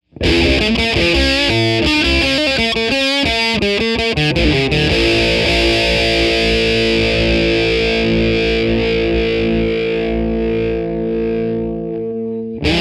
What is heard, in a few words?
Electric